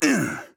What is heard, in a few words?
grunt attack; Engineer; Paladins